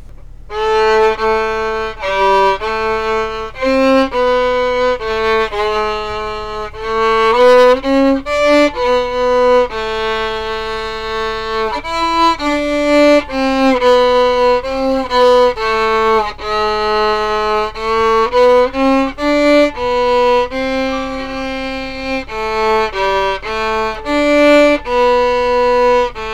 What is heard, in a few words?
Coventry Carol